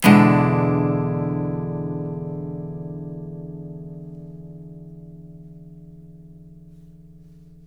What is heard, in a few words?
CHORDS, Blues Guitar; ILIO; Fretworks